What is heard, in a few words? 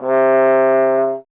Trombone C; Miroslav Vitous - Solo Instruments VOL-1; 90 s Sample CDs